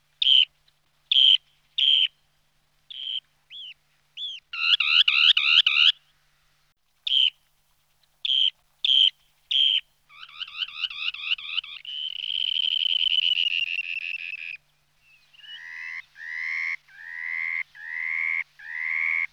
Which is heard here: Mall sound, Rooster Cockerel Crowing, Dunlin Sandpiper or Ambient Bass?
Dunlin Sandpiper